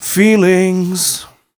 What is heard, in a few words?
m 127 mel a, Vocals